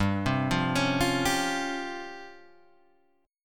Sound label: Db Major 7th Flat 5th G, chord